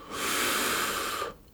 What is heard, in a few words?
breathe IN; cigarman